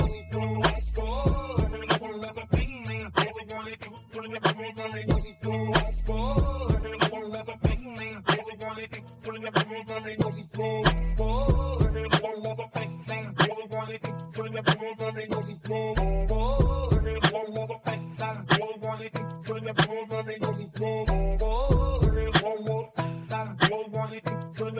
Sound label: detroit rap to jazz denoising; riffusion